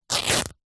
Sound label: foley fabric towel rip